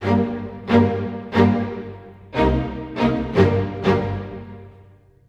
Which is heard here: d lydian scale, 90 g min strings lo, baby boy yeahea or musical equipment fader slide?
90 g min strings lo